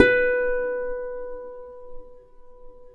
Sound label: B4 HARP; USB Soundscan vol.62; AKAI